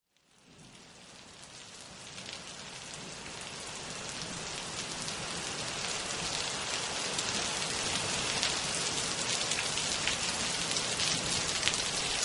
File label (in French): PLUIE; ST EUTROPE 2018; MONTRICOUX